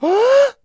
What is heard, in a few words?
GASP; YELL